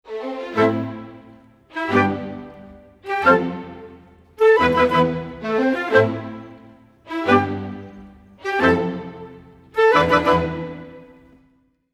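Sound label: 90 A minor 4 bars full mix, Orchestral arrangements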